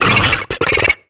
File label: headcrab reviver, ranged warning; creatures; vox